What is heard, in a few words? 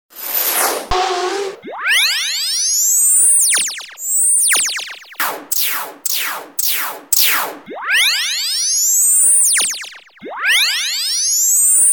Tweeter Test